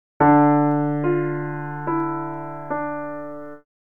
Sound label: prominent bass, digital piano; better tone